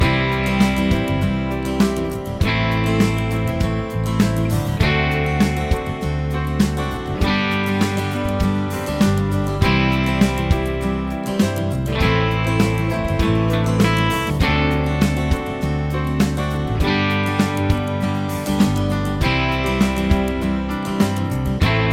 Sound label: progs with applied chords